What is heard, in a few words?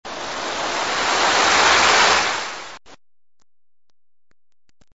wave